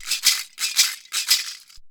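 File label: maraka groove; Best Service; World Colours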